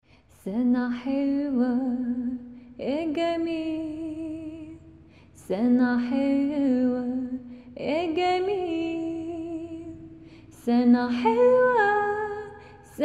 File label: Happy Birthday in Arabic